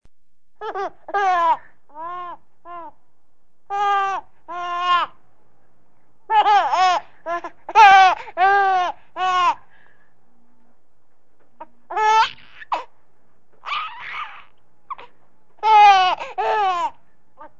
risas bebe

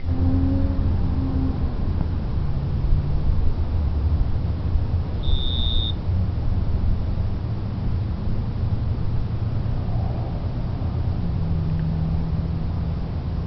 la naturaleza

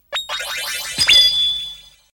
efecto; sailormoon

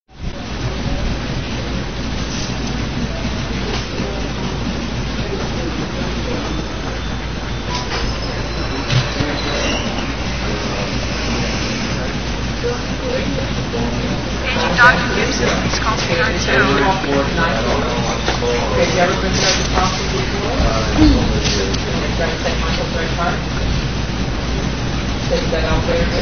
hospital

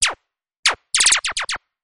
pistola

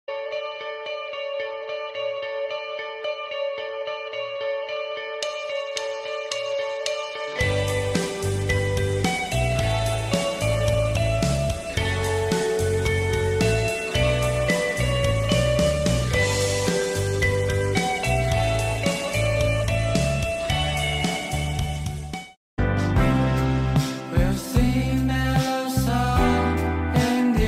lindo ringtone

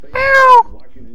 gato

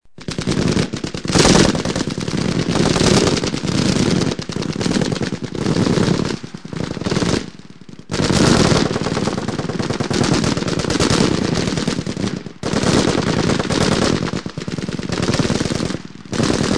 ametralladora fusil

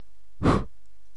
respirar

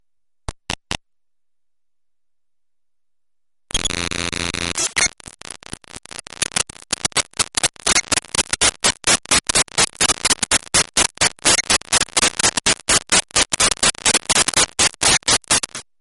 digital

